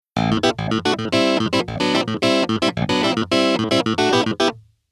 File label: Keyboard Clavi